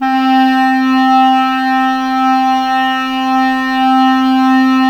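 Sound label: clarinet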